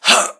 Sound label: Swing; doctor